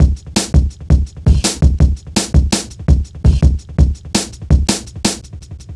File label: Funki Tuff, Jungle, Drum Loops 166.5; Zero-G Jungle Warfare 1, 2 & 3